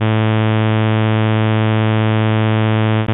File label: bytebeat